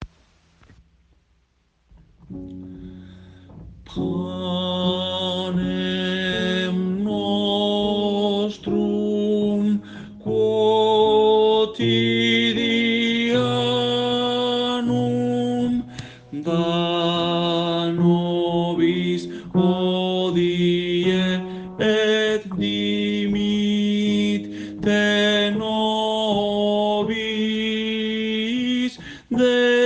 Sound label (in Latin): Pater Noster Bajos